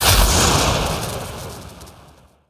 Thunder Lizard

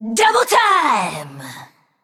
Female Naga Attack

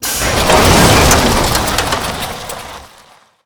Darkmoon Tonk, Attack

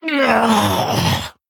Female Bear Death

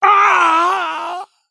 Male Night Elf Death

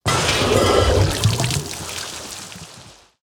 Slime Tank Underlay Death

